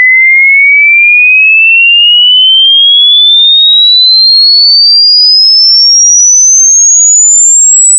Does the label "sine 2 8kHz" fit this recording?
Yes